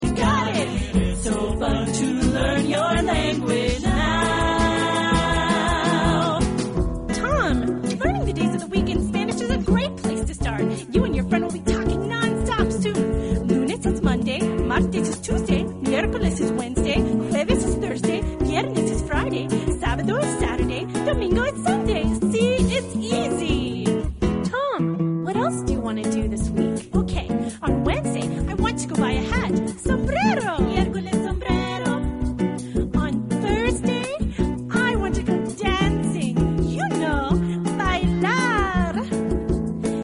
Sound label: tom